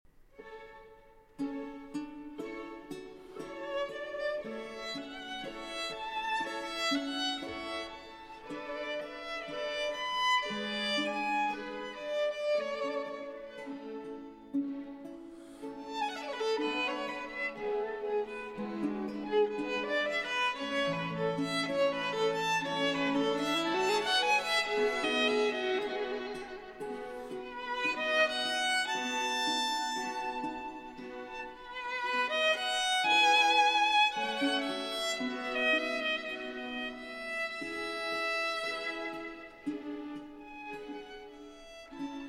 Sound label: Concerto For 2 Violins in A Major; L'estro Armonico No. 5; Vivaldi